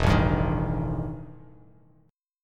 E Minor Major 7th Double Flat 5th E Bass Standard

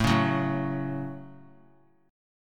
A 6th; strummed

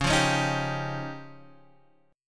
F 7th Suspended 2nd Sharp 5th

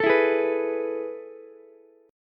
A Major 7th Suspended 2nd, Ukulele; AM7sus2 strummed; 14,16,16,14; JGuitar

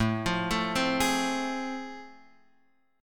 A Minor Major 7th Sharp 5th, Guitar Open F; arpeggiated; AmM7#5; x,4,5,4,3,3; JGuitar

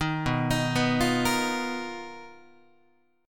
B Major 7th Flat 5th B down Guitar; BM7b5; arpeggiated; JGuitar

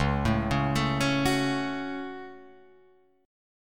Db Suspended 4th Sharp 5th Guitar Open F; arpeggiated; 1,4,1,4,4,1

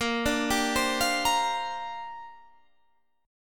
C 7th Suspended 2nd Suspended 4th Bb

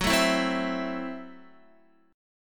Gb Minor 7th Flat 5th, Guitar; strummed; JGuitar

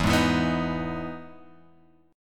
E Major 7th E down Guitar Open G

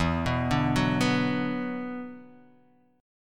E 7th Suspended 2nd, down, Guitar, Half Step Down

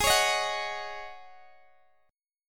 G sharp 7th Suspended 4th G sharp, Guitar DADGAD

